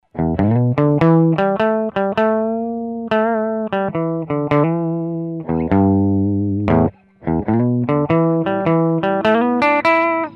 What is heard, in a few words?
JC Clean, Roland Micro Cube